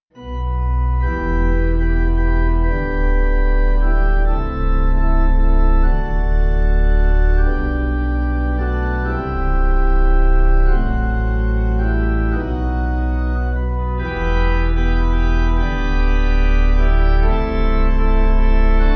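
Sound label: Pipe Organ, Music Backing Files for Churches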